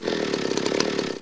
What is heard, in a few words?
Sound motor